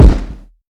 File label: tight bass drum single hit D# key, Royality free kick drum sample